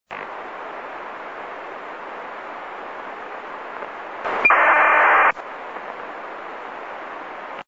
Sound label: acars